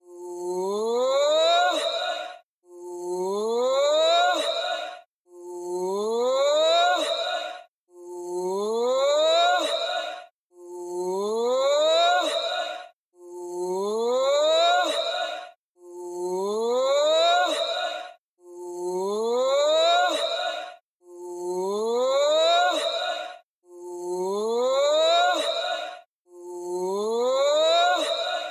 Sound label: Jungle Bird